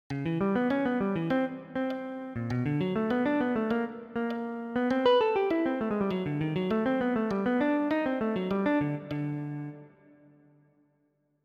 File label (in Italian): Esercizio, guitar